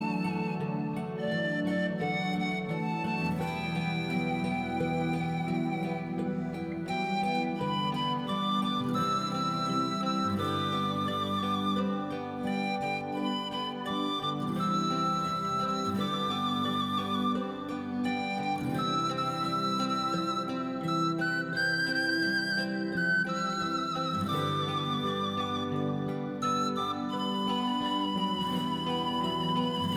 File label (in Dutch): panfluit